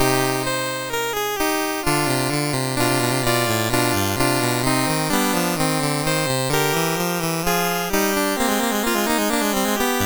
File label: La Tendre, MOS6581R4